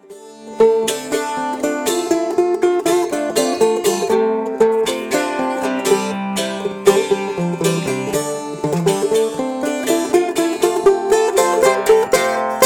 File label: mandola